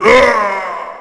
Boy, Collisions; Decors

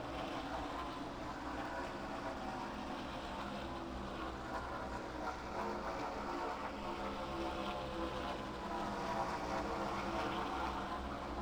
Cars ambient; XBOX

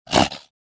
jump

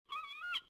seagull; Soldier Of Fortune II Double Helix; XBOX

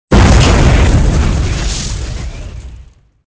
explosion fiery; weapons; Soldier Of Fortune II Double Helix; XBOX; explosions

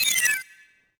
option cancel; Pump It Up Exceed

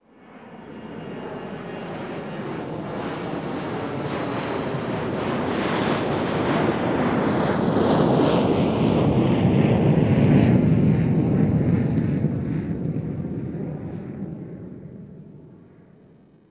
jet; langeles

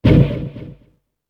MENU CANCEL; UFC Tapout

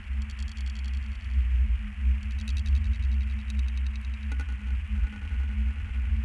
ambient

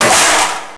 vault sign falls; Max Payne; subway

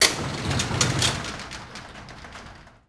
garage close

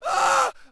pre fall, Rider

